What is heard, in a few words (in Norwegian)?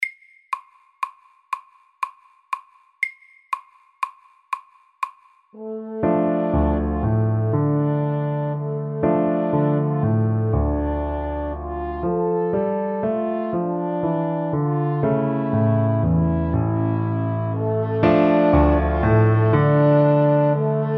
Bugle horn SAKTE